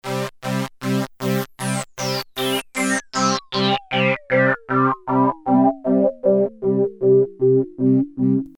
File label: pulsemod; obx